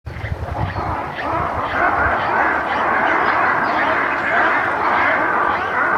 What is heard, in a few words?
Lemurs Fighting